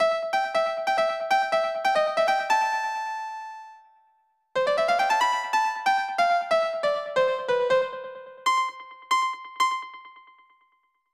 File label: Piano; Treasure Hunter G; SNES; Piano Skill Level 2